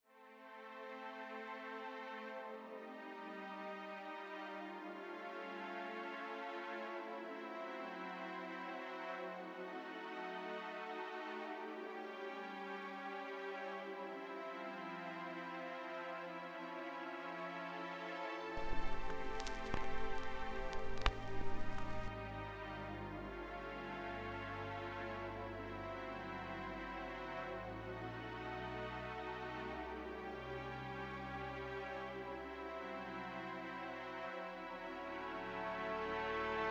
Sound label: orchestral